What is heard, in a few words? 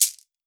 shek, WORLD PERCUSSION